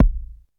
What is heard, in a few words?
00s fat bass drum single shot a key, Royality free kick drum one shot tuned to the A; kick drum samples